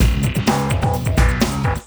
CB LOOP; Zero-G; Chemical Beats

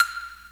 BLOCK, drums; Crypton - DrumBase5000; CD DRUMS #2

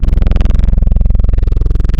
Spectral Growl, wavetables; Spectral Growl Wavetables Vol 1

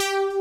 DNC SYN BS, DANCE; Pro-Rec - Synthsonic; Z1 DANCE

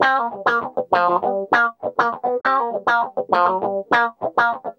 GUI, LICKS

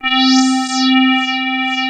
SQR LEAD C3, PPG, analog; USB Soundscan vol.49; Analog Fantasies; AKAI

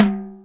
Timb, Percussion

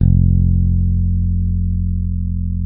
bass, sustain; Sampleheads; Will Lee Bass Library

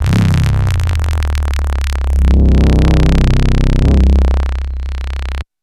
bass one-shot; Fargo; KJ Sawka - Drum n Bass & Beyond

